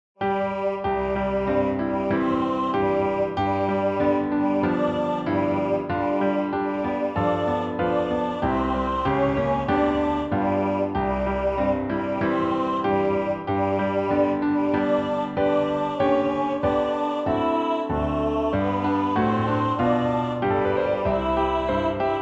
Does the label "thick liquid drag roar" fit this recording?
No